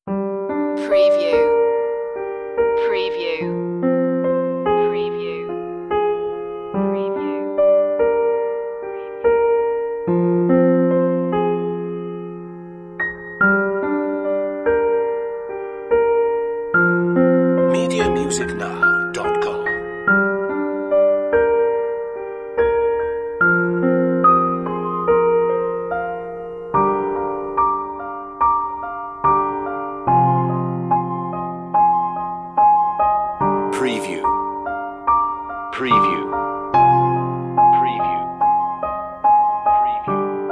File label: piano solo